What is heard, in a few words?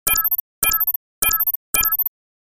interface